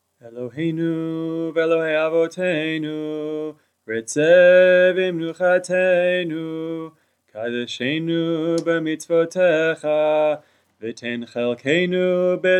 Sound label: alternate melody; mkadesh hashabbat